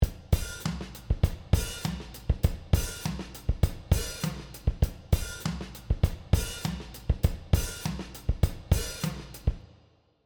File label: Drum